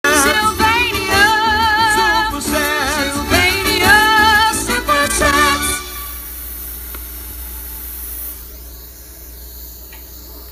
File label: Jingle; Sylvania